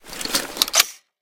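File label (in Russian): шумная подготовка к выстрелу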